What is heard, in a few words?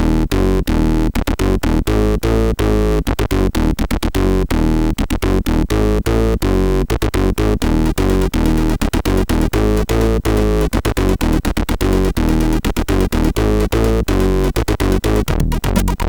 Background tune, MOS6581R4; sid